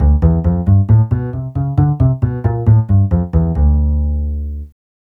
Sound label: TV Suspense Bass-D, 135; Zero-G Kocktail Kollection; Construction Kitz (Lounge); TV Suspense Mixes 135